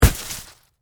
Log Drop Ground, Impact, Log, Drop, On, Ground, Leaves; Wood; Materials